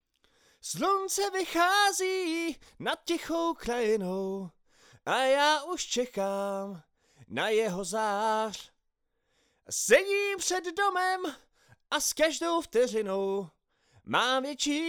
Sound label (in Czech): Vokál solo AKG 440